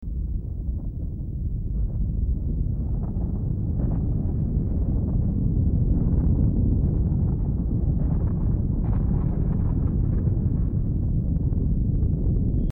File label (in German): erdbeben dumpfes grollen